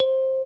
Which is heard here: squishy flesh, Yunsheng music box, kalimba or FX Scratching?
kalimba